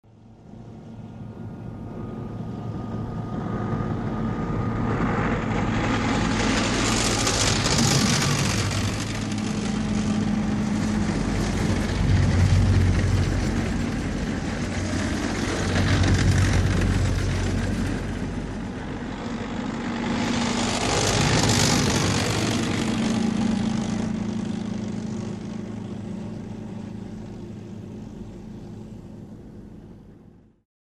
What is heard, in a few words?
Old Military Trucks passing by